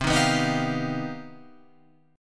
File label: C Minor Major 11th, Guitar, Open F; CmM11 strummed; JGuitar